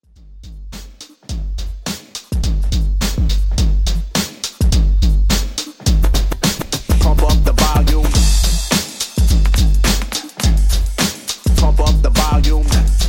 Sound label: 80s Redrum 105Bpm